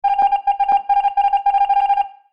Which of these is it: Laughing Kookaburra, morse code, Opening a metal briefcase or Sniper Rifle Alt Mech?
morse code